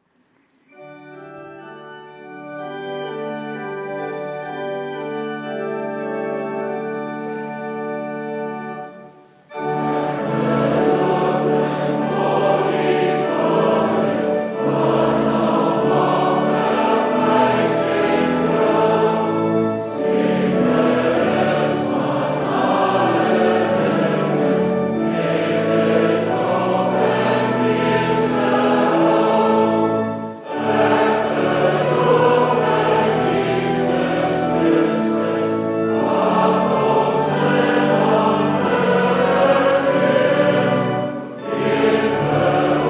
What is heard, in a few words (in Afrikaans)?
Gemeentesang; Skrifberyming 12-2 vers 1, 4